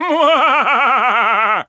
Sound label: Wizard Laugh